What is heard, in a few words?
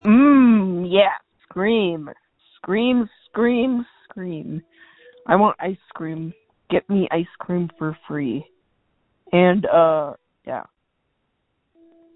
Scream